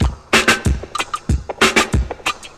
93 bpm reggae drum beat c key, Free drum loop - kick tuned to the C note. Loudest frequency: 2117Hz